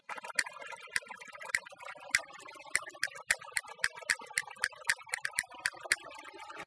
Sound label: Castanets Violin Applause